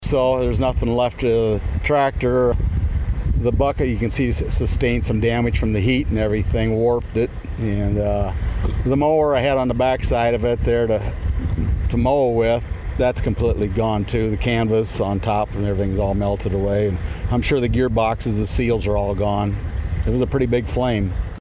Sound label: tractor fire